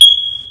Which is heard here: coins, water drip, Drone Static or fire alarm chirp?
fire alarm chirp